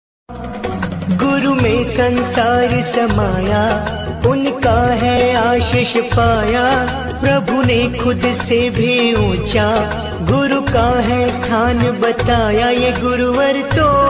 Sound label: guru purnima bhajan